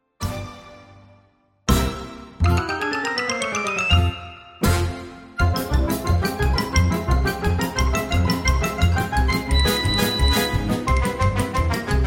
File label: middle instrumental original key